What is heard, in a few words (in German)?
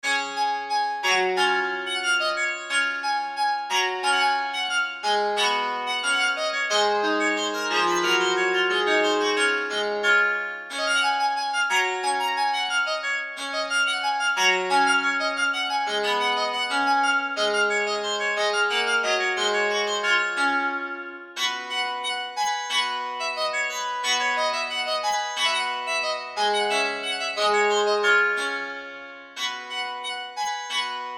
streichpsalter; dowland puffe